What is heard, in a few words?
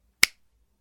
Finger Snaps Sounds